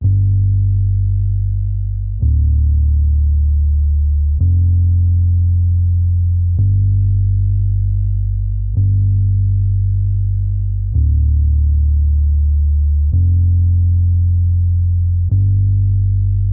110 BPM G Min Bass; Cymatics; Infinity Melody Collection; Saint Tropez

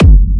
Techno Kick; 30 Techno Kicks 2025; Ghosthack

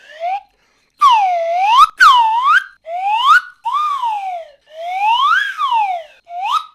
whistle, Cartoon Film Effects

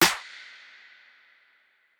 Clap (Paper); One Shots; Cymatics; DOPE DRUMS VOL. 1

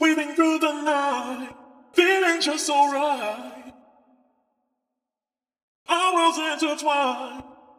Vocal Loop - 123 BPM G# Min; Cymatics; ANALOG EVOLUTION Beta

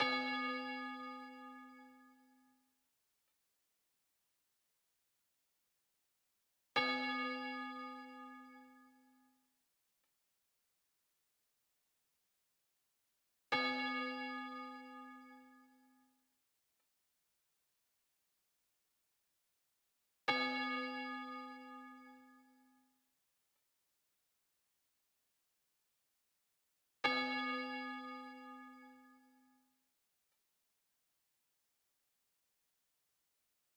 142 BPM F Min Bell, Drill; Loop Stems; Cymatics; Bastille

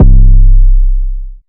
Kick 808 - C; 808s; Cymatics; 2022 Melody Mystery Expansion

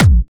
Kick, Bonus Drums

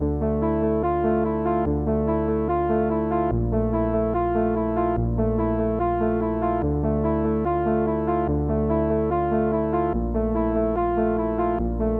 145 BPM D Min Keys; Melodies; Loop Stems; Cymatics; 2022 Melody Mystery Expansion; G Class

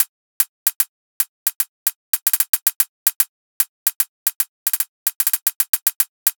Hi-Hat Loop 150; Dubstep

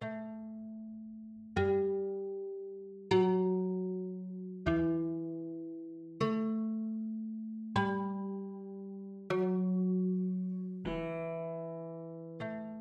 155 BPM E Min Mandolin; Loop Stems; Cymatics; 2022 Melody Collection Platinum Expansion - Pt 1; Dubai

